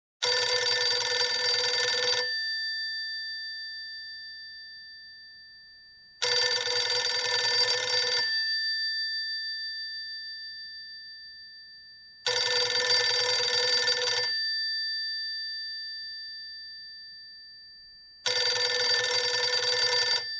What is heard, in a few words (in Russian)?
zvonit starij telefon. nabor cifr nomeronabiratelem.